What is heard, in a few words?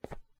bedrock step; dfcaverns; underworld